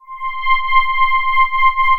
Tibetan Bell Pad; Ethereal Pads; Ableton; Mood Reel

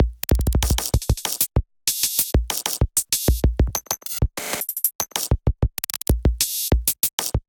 Kit groove 128 bpm, Glitch and Wash; MIDI Clips; 122 - 130 bpm; Rest Pattern Kit; Ableton; Factory Packs

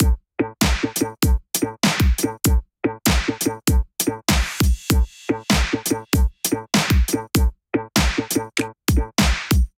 Nectarine Kit Melodic 98 bpm, Drums, Complex; MIDI Clips; Drive and Glow; Ableton; Factory Packs

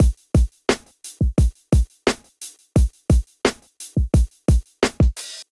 Bounce Kit, Golden Era Hip-Hop Drums; Otari; Ableton; Sound Oracle

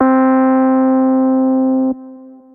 Muddy Whirl Piano; Piano & Keys; Ableton; Factory Packs; Chop and Swing

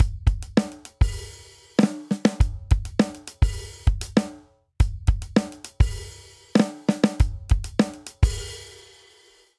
Linear Funk Outro 100 bpm, Drum; 4 4; MIDI Clips; Ableton; Factory Packs; Drum Booth

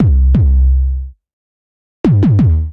Ballistic Boom C Minor 88 bpm, Bassline; MIDI Clips; Tonal; Ableton; Factory Packs; Chop and Swing

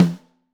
SN2 Top, Snare; Ableton Foundation FreePacks; Snare Designer

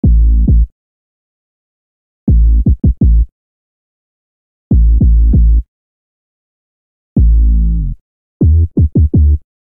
New Old School A Minor 83bpm, Bassline; MIDI Clips; Ableton; Factory Packs; Chop and Swing

